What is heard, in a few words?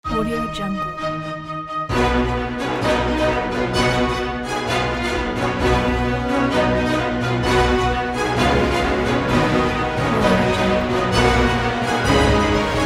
greating ceremony